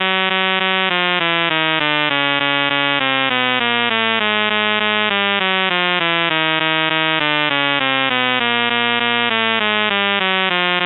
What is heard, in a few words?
Helix Circle Rev